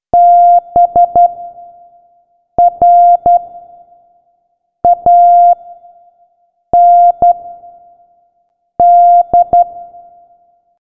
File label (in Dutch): morsecode